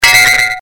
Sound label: evil pain; CAC ASSETS